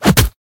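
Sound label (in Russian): boxing punch sharp precise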